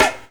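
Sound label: snare drum funk; E-Lab; X Static Goldmine 2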